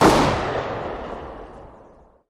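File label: weapon SSG 08 sounds; iz igry cs go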